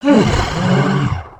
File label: idle, creatures organic